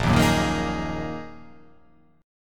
A sharp 7th Suspended 4th Sharp 5th, guitar chord; 6,6,4,3,3,5,5,5

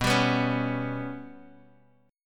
B Suspended 2nd Sharp 5th down Guitar Open D

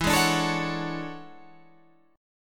E 13th, Guitar, Half Step Down

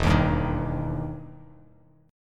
E Minor Major 7th E down Bass Standard

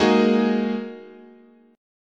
G sharp 7th Suspended 2nd, chord

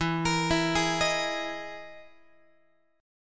E-Major Sharp 11th E, Guitar; EM#11 arpeggiated

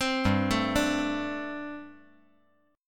G Suspended 2nd Suspended 4th; chordshape; down

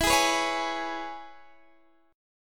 E 6th, Guitar, DADGAD; chord shape

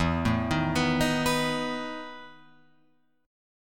E Major 7th Suspended 4th, Guitar, Open F; EM7sus4 arpeggiated

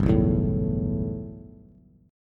G 6th Add 9th G, Bass; G6add9 strummed

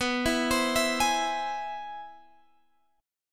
C Augmented Major 7th B

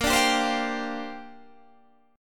Bb 6th Add 9th Bb Guitar Open D

